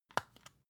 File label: floss open handle, Bathroom; haus-garten; badezimmer